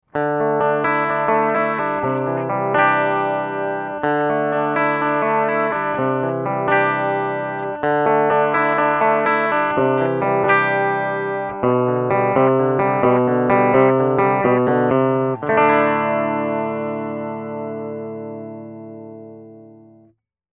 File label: PITCH SHIFT